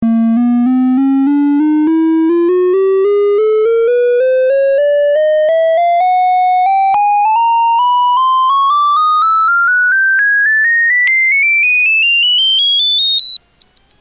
half steps seq knob, analogsynth, VOLTAGEQUANTIZER